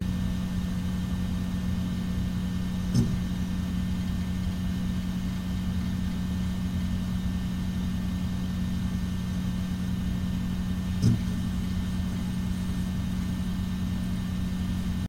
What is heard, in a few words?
desktop; Golden Crayon Sounds